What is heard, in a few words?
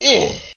pain; konkey dong